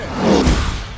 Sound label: swoosh; National Security Press Kit